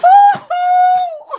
hoo hoo

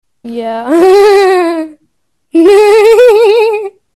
laughing girl; hellotalk

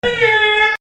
airhorn

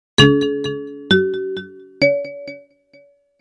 logo tones

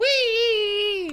weeee

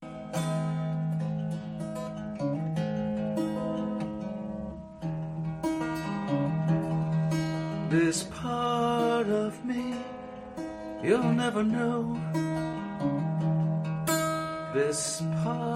singing; obi wan

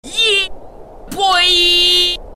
angry squeaking

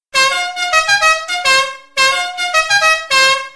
airhorn

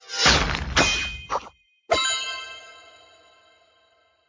special offer sound; angry birds space

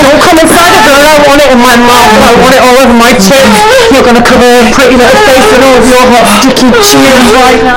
moan

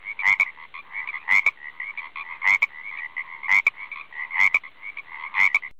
frog croaking

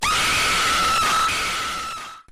screamin

